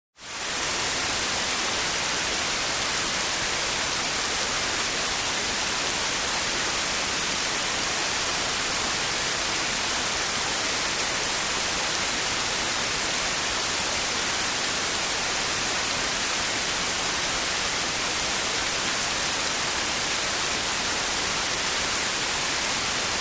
Rain Hvy Amb Fine, ext

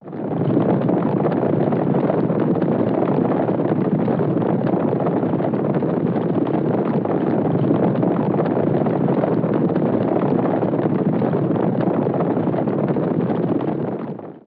Horses Herd Gallopin

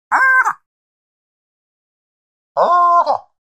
Horn 2 Haaooga Horn

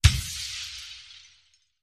Glass Crashes Design; windows & mirrors

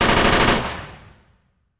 Machine Gun Sngl Rap, single burst

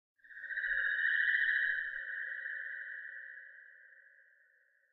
Bird Chirp Warble; animals

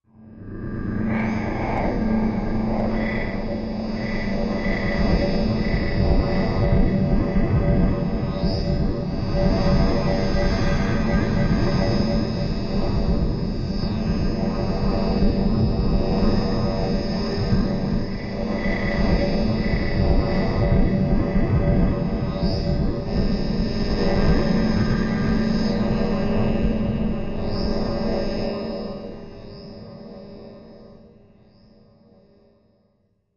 Fluid Wires Elect Bubbl, energy beams

